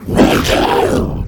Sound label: gurgbarf